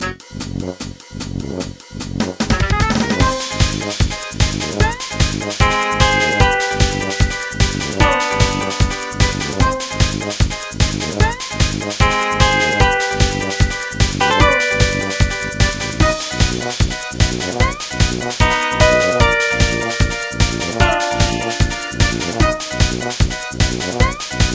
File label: Boss Pinch Remix; Sonic Advance 2